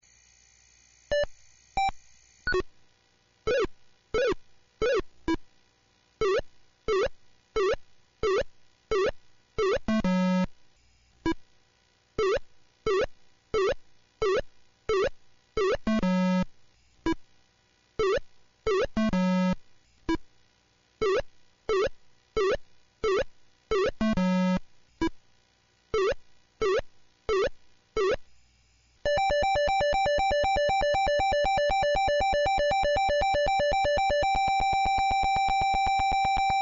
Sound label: Pass Lose; othello